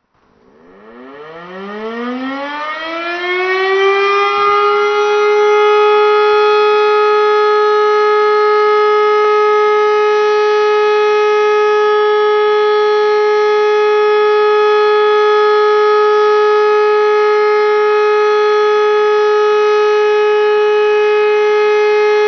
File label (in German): entwarnung